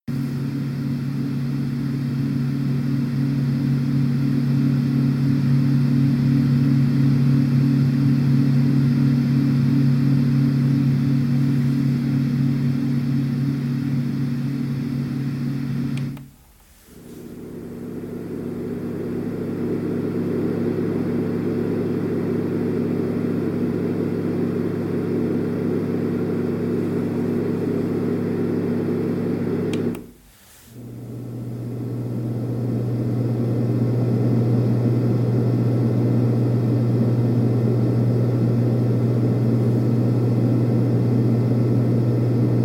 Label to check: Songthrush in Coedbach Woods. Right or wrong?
Wrong